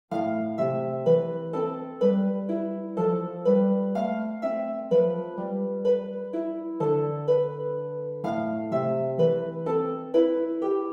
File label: trumpet harp